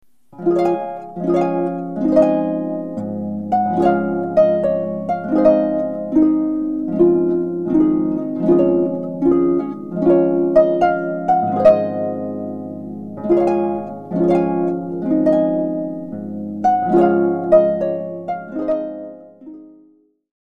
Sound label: Trumpet Tune, harp